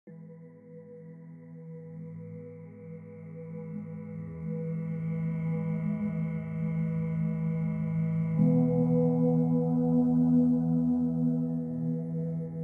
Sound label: 528 Hz